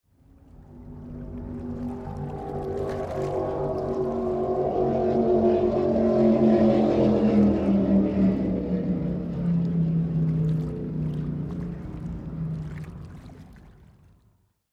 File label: Boat with Wake